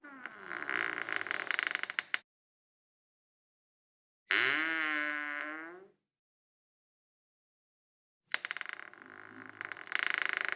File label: squeaks and creaks wood door creaks; Sonniss GDC